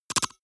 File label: noise metal scratch splutter; maschinen; signale; soundpool